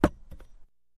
Axe Falls To Ground, Dull; Axe Fall On Ground With Dull Thud And Light Bounce. - Light Metal Thuds